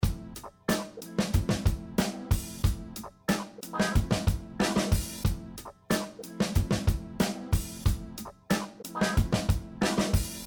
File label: Staccato 3 Voice